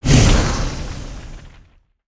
Spinjitsu Fire Swing

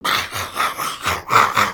Laugh; Skullkin; creatures organic

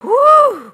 stink; emotes

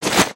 shorter BACKPACK cloth; aronwk collection; ndaudio

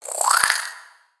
Spider Idle; Lego; creatures mechanical

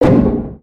Siege Tower Distant; destructibles

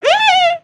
Ribbit Frog Hat Mmmm; creatures organic

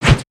Sword Slash; weapons; aronwk collection; ndaudio

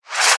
Popup

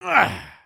stun

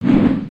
WHoosh; creatures organic

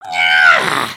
disappointed; emotes

